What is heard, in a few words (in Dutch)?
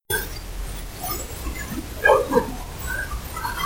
houtblok